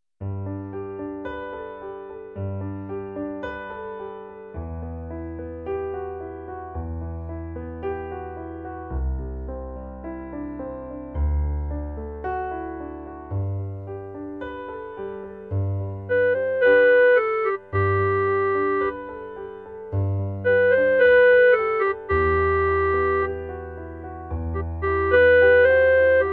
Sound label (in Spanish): CLARINETE; concierto musica i eso